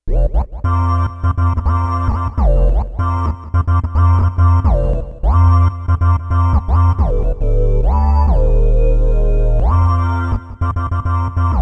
Atmo Bass, Roland VC-1

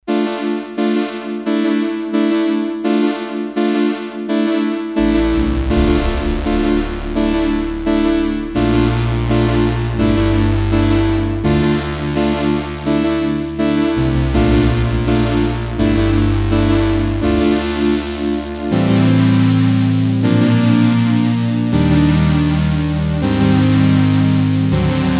Analog Pad, Korg DS-8; Factory Patches

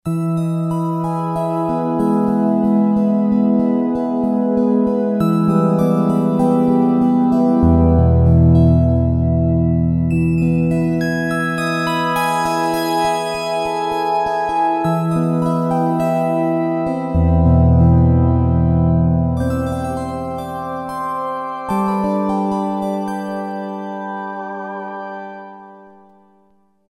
Square Bell 2; Roland SRX-05 Supreme Dance

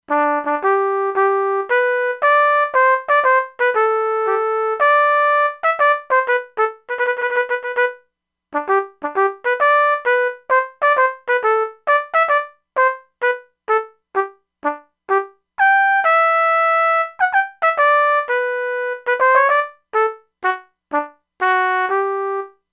Trumpet; E-mu Proteus-2

